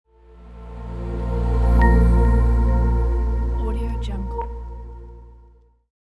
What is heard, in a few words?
opening logo